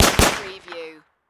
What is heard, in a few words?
Submachine Gun Sten